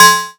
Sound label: PULSE, METAL, PERCUSSION; Drum Hits